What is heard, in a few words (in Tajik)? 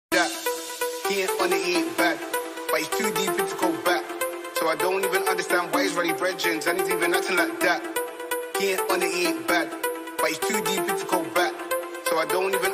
музыка барои мошин музика для машинах